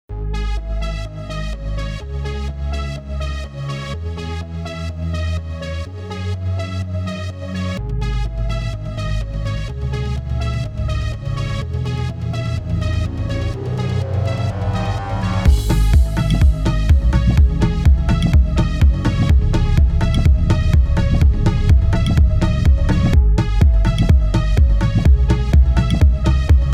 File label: Hype Beat